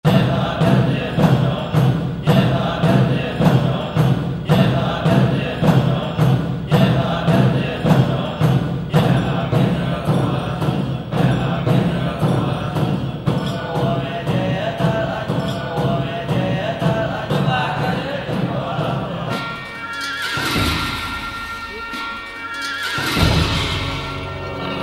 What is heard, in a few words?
Wild Tibet Loops; Spectrasonics Heart Of Asia